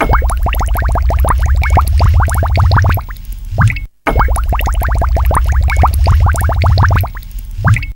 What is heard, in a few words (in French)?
Bruitage Bulle; Effets Sonores